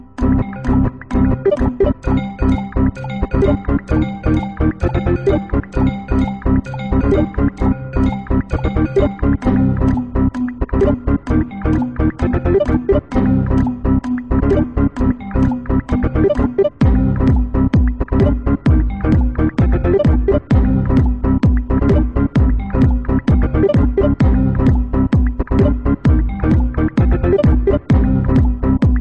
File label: windows remix error